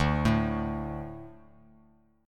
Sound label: D 5th; 10,12; arpeggiated; JGuitar